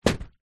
leisure kite nylon hit ground

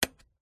household radiator heater portable switch click

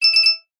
multimedia notification bell glassy chime

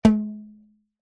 foley elastic band stretched ping; zapsplat

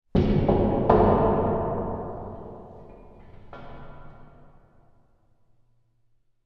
impact metal slide hit knock playground resonates

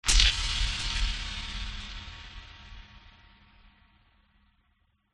cinematic hit thud electric; sound design

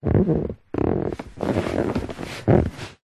vehicles car driver seat body movement creak

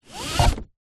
vehicles car hyundai trajet seat belt pull fast; 2006

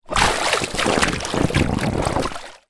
inflated lilo movement water squeaks; zapsplat

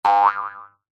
cartoon spring boing jump jaw harp

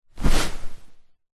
household small foam chair fabric sit down; zapsplat

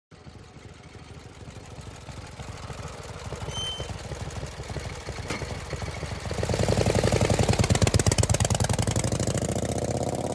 MOTORCYCLE Harley Drive Arrive Set Off; TRANSPORTATION

